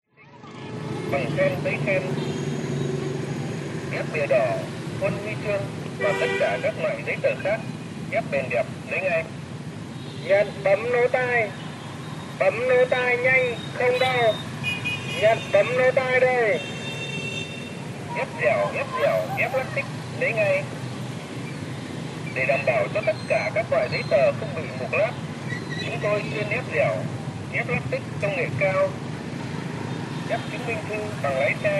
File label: city traffic busy street vendor loud speaker close by buon ma thuot vietnam